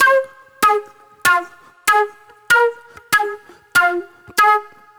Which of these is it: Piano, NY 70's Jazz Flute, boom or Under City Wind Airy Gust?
NY 70's Jazz Flute